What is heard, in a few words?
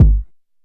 small reverb kickdrum sound g sharp key; Duration 0:01; Samplerate 44100 Hz; Channels Mono